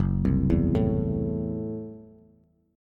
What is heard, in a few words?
Gb Minor 7th Flat 5th, Bass; arpeggiated; JGuitar